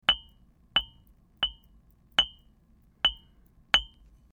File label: bottle